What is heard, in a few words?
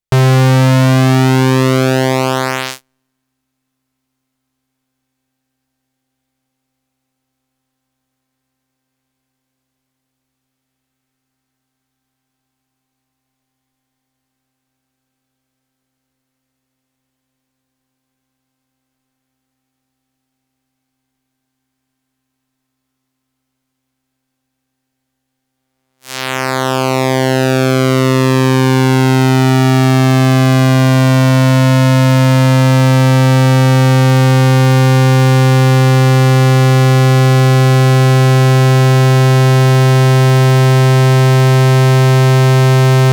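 waveforms pulse width modulation; toraiz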